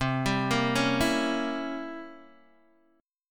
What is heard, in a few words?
C 7th Flat 9th C down Guitar Open D